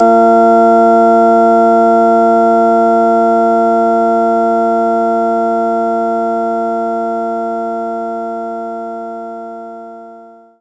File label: Hammond Organ; knights try